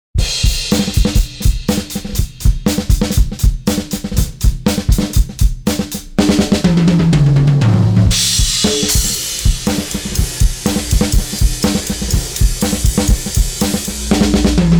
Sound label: drums 3band compressor; Titanium 3